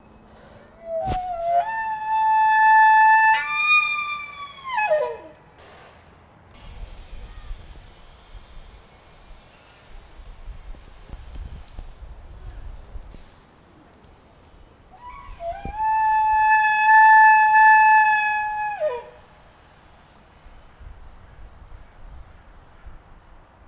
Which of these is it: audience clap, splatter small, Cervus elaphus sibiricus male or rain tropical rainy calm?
Cervus elaphus sibiricus male